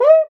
CUICA, ETHNIC PERC; Roland LA

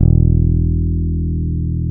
FEND P-BASS E1

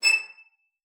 VIOLIN SPICC, E7; Xsample VOL-5

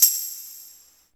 124 TAMB+VRB; East West - Phat & Phunky CD2; 90's Sample CDs

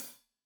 Hat Loose, Hi-Hat, Cymbals Stereo

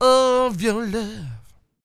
SING W, Vocal Planet Blues & Soul, BLUESY; ILIO

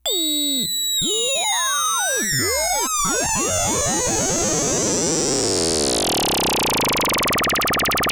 Moog C#4, rare synthesizer; Hollywood Edge; Giorgio Moroder Rare Synthesizer Collection

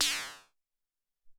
Sci Fi

